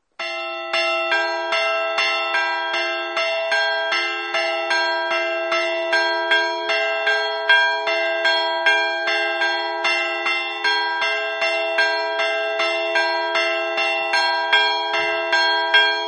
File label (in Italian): azzano, campane